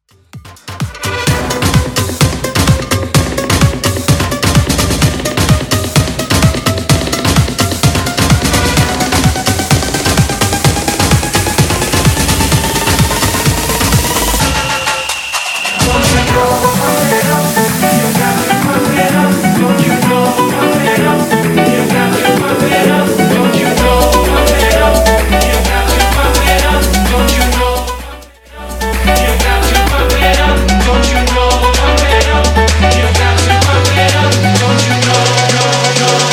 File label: Bass House 128bpm; Reworkz